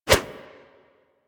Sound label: Flip Whoosh; Warframe; Generic Foley